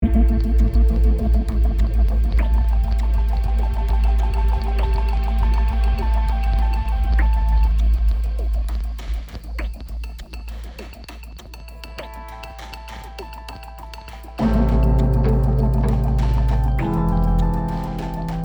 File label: Positive Creep suspense, dramatisch